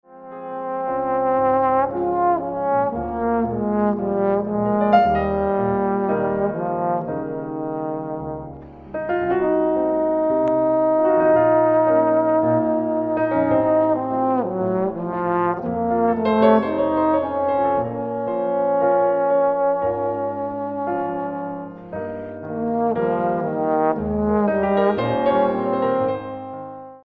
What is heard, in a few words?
Air